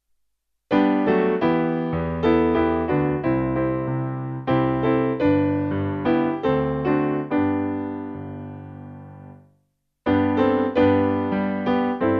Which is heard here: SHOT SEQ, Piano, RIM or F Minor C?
Piano